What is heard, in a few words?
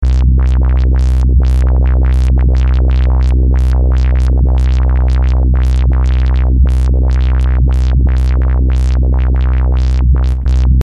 random filter